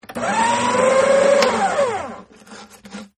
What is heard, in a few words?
Paper Shredder Rev, Paper Shredder Going Reverse, Paper Removal, Servo, Motor; Miscellaneous Office